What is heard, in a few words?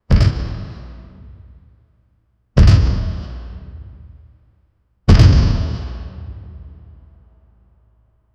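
loud mechanic thump on the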